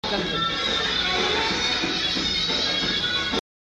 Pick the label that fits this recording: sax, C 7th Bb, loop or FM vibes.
loop